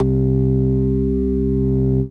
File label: Ensoniq SQ-80 Organ C4